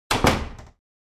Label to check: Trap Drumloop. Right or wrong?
Wrong